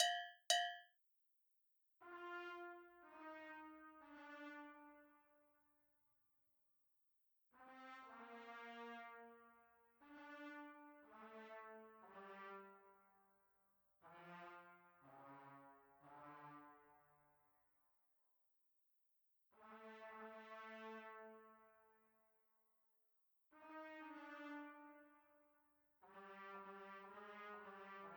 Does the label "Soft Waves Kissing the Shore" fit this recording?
No